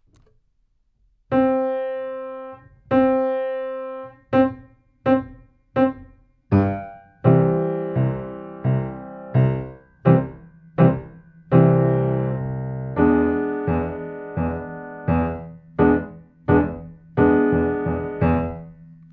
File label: sax, C 4 bars